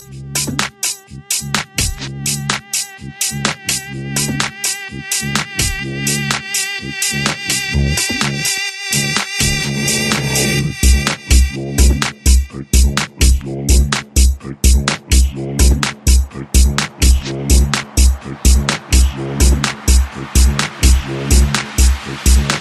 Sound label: techno